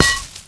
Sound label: bush; Mafie 1